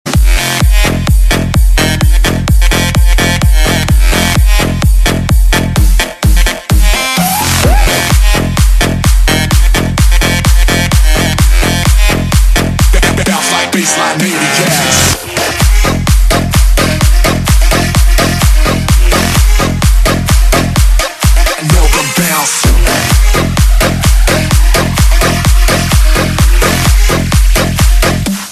bassline

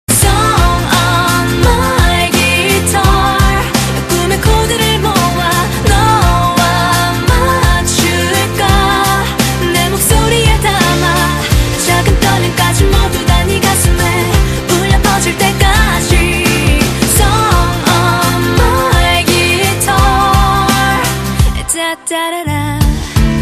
song on my guitar

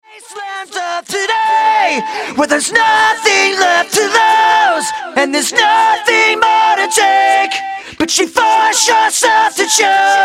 acapella

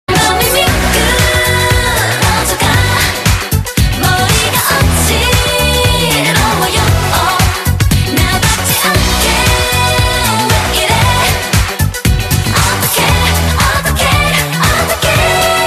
vibrato stellar

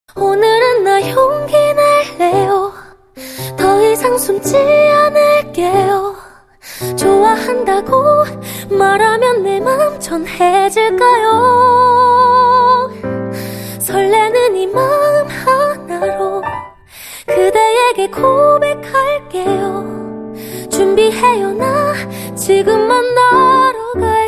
gao bai acoustic

